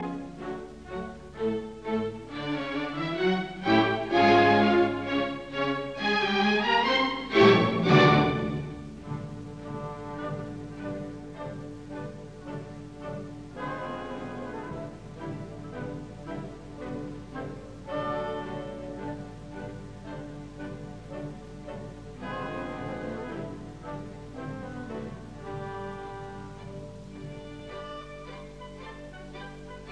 Symphony in D major Classical Gavotte Non troppo allegro; Prokofiev; Op 25